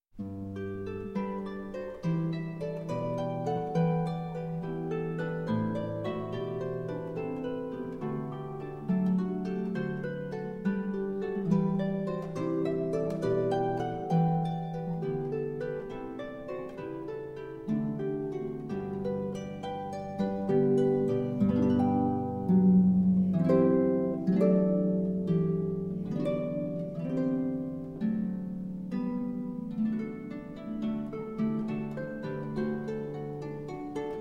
Cantata, BWV 147 X. Choral Jesu, Joy of Man's Desiring; J.S. Bach